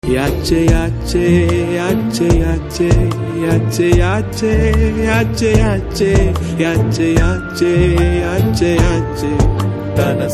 BG Telugu Ringtone

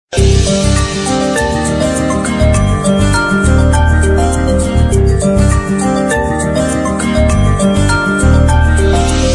Love BGM Telugu Ringtone

